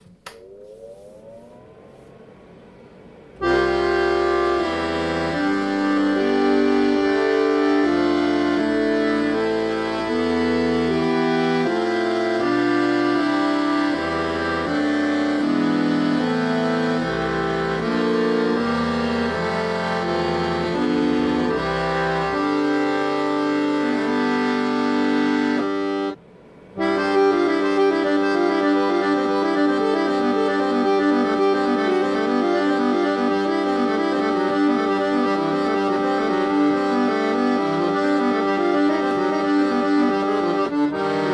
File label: ORGANA praeludium C; BACH